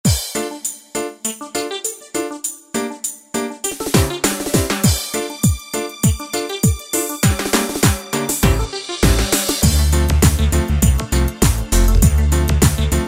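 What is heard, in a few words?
Pop Reggae, Yamaha DJX, Styles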